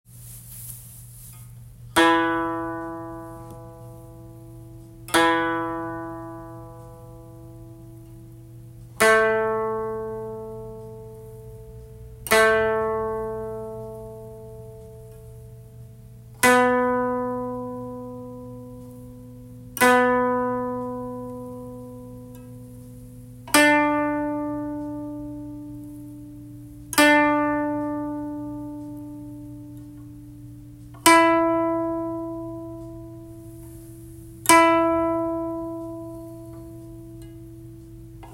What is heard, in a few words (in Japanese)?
第一, 箏 調弦; E A B D E F# A B D E F# A B; 第1箏